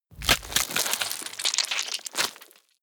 tear bone and flesh

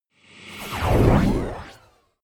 disintegrate

